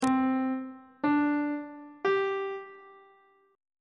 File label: c suspended 2nd triad chord intervals on treble clef